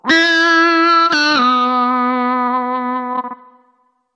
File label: Guitar Noizes weird one